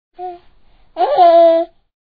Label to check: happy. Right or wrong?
Right